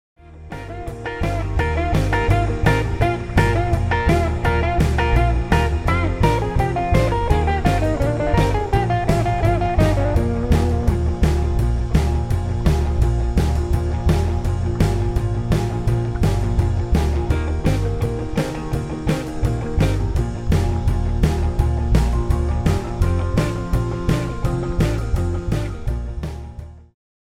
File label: No Vocals